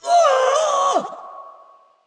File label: Wound Medium, Creature; Fjola